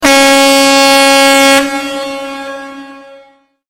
ship siren; ringtones

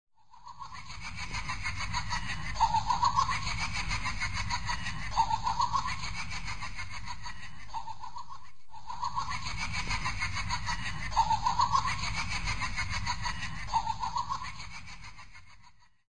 puppet laugh; jigsaw

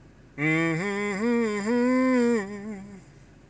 Muddy water short

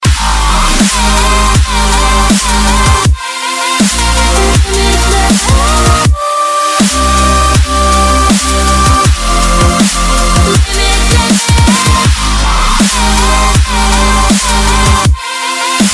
Dubstep

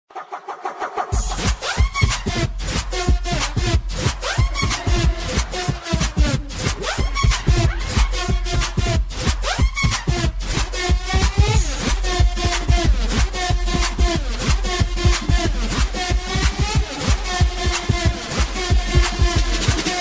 cool effect

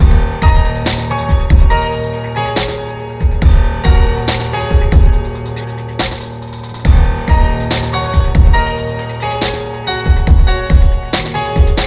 Piano Beat, ringtone

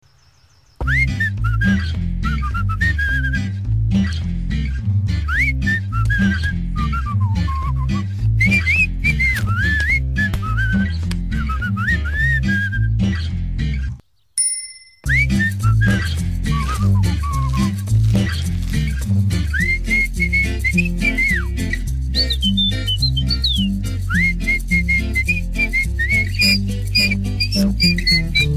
Junk Email Whistle

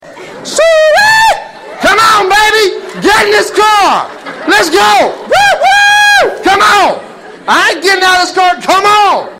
Rude Honk

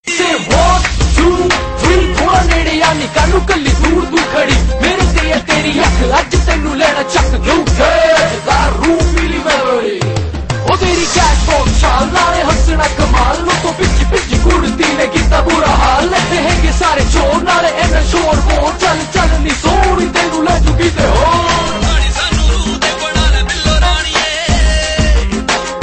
rap, hip hop ringtone

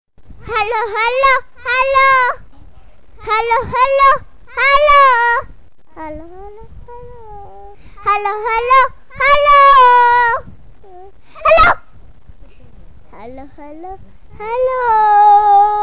child melody hello; children

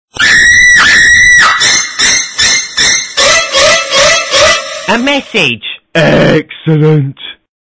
message alert; ringtones; igor

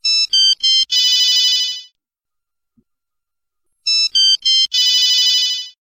classic sms

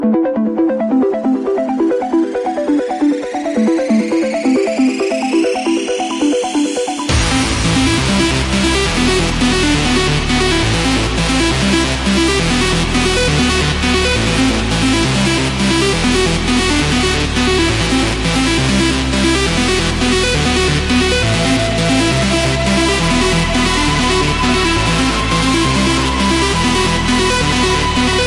perfect dj tune, electronic ringtones

